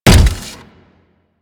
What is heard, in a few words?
Step; Warframe; Corpus; Arachnoid Wraith